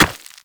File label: Run Rock, Footsteps; Warframe; Characters; Operator